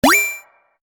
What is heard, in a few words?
Digital Signal Wapling; Digital Clicks, Blips & Alerts; Digital Sound Effects